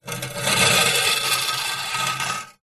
industrial shovel spade metal drag scrape concrete; zapsplat